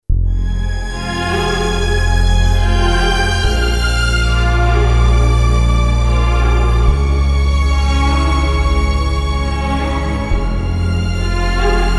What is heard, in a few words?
Instrumental; Veer-Zaara